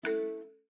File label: balloon